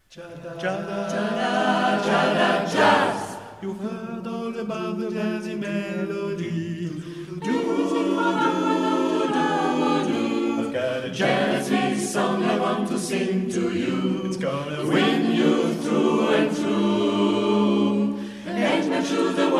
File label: jazz